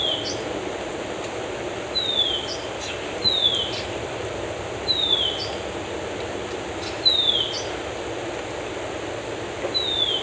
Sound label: Birds in Forest; Loop; افکت صدا حیوانات; Animals